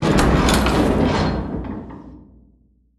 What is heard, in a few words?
Gate Open; foley